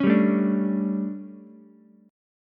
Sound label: D Major 7th Suspended 4th, Ukulele; DM7sus4; strummed; JGuitar